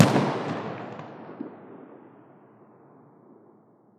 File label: scar20 distant, weapons; Counter Strike Model Pack; CS2